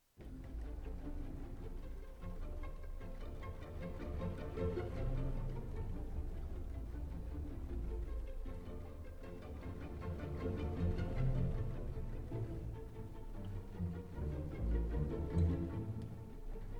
Symphony In F Minor, Scherzo. Pizzicato ostinato - Allegro